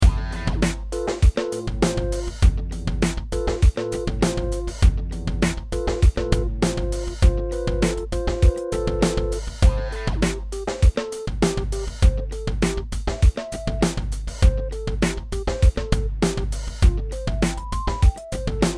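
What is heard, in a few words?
funky keyboard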